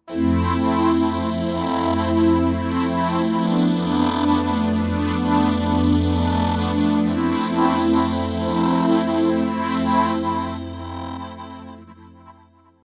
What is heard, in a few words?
Montage